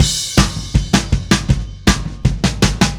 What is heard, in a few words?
Happy Pub Beat Crash, Indie Rock; Sonic Foundry (Sony Creative Software); Indie Rock Anthems; Session 05 - Happy Pub